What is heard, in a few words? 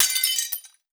plate Hit; netent